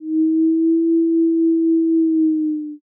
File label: erhu